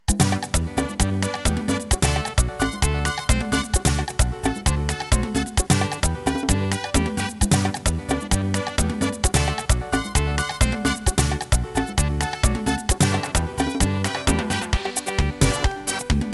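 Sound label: Merengue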